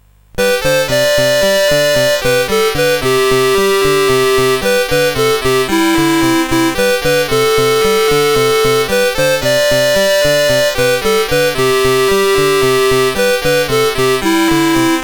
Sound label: CSG8580